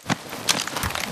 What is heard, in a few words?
spectre blind fire grunt; Titanfall 2